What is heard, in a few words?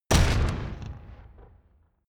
metal spike